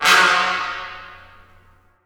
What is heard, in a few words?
String Small Brass